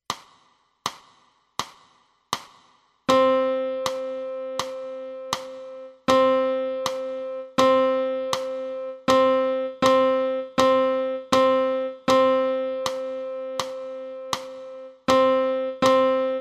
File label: piano